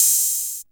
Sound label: HH hh; Best Service Gigapack 2